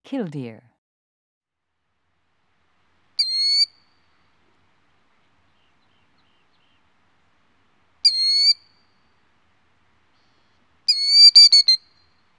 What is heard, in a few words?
Killdeer